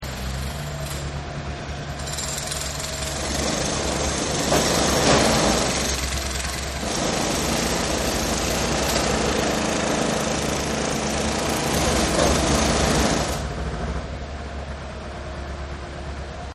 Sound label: Construction; Construction Yard; Backgrounds; Partners In Rhyme